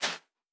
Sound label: Footstep Sand Running